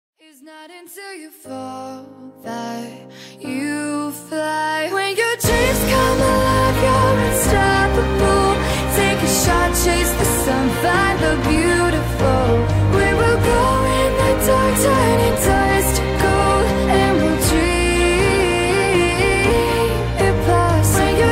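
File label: Possible Huawei, ringtone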